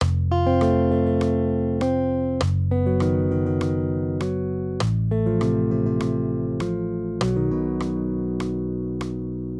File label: maj triads; F-B; accompaniment